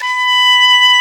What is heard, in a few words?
English horn B4; InVision Interactive; Lightware VOL-1; Instruments & Percussions